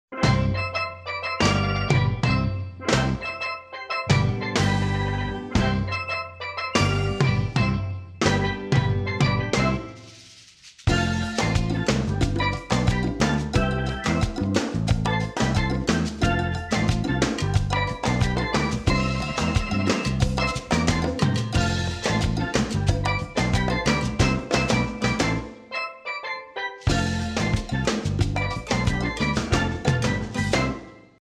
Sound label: Tropical